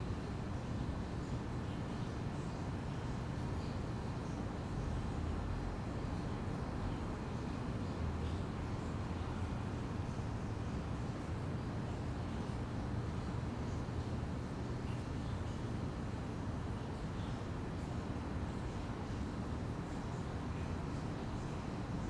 QUIET STREET Slight water streak from sewer city hum